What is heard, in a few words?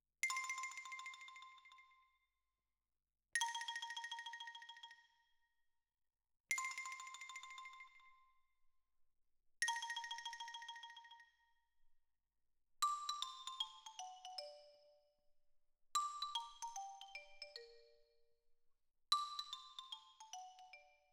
Xylo